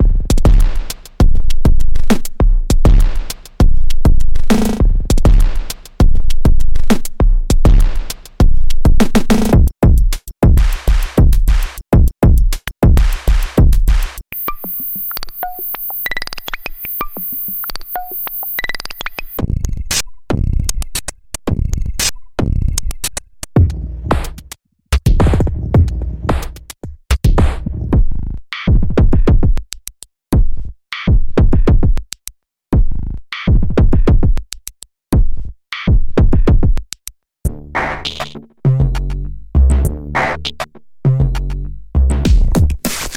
Beats; Audiomodern; Biologic

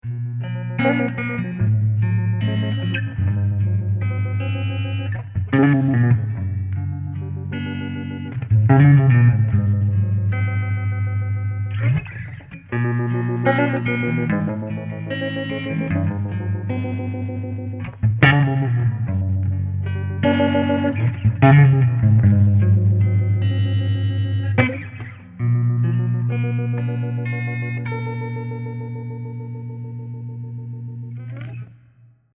Spring Reverb Guitar Clean; pluginboutique

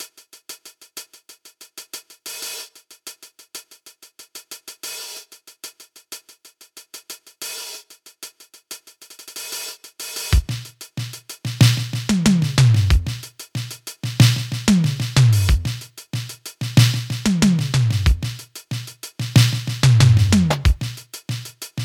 Eighties Pop Grooves; Number One Hits EZX

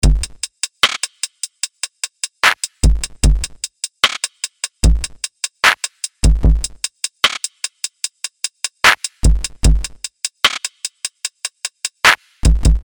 75 Softy Full Drums

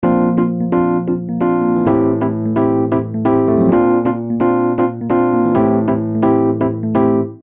130 Bb Electric Piano

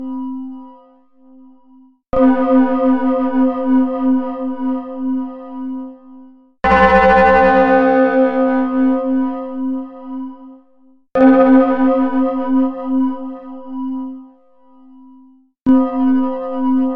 Rubicon Drone; Softube; intelijel; Plugin Boutique

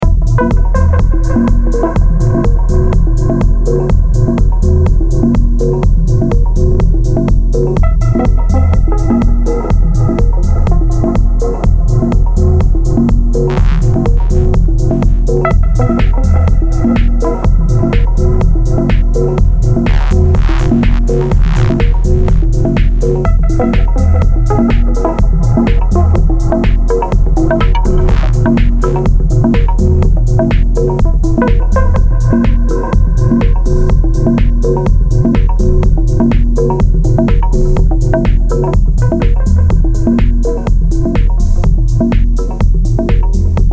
Techno; Zampler Peak

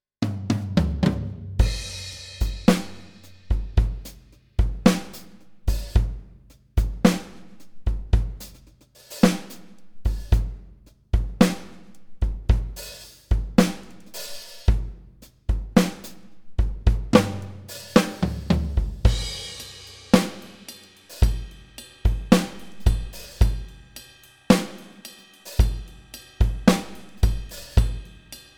Eighties Pop Grooves, Premier Kit; Superior Drummer 3

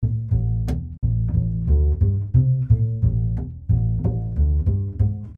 90 A Double Bass

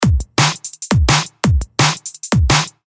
170 Dam Two Step

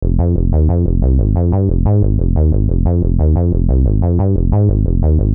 90 Fm Synth Bass Eighty

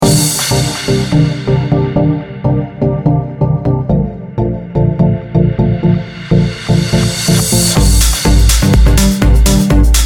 Classic House

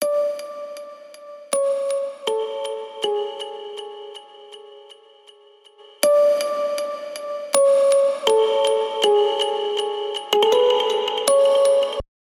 Musicbox

